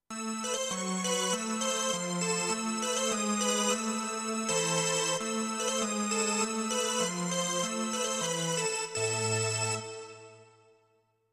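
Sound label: Cheezy Organ, digital piano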